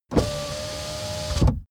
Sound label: car window back close part